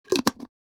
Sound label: Skin care cream jar close